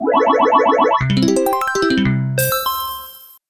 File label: game complete effect